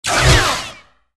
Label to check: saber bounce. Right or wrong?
Right